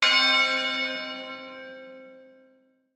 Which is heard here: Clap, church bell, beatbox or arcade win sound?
church bell